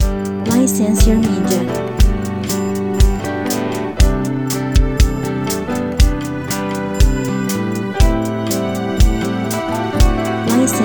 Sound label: Bossa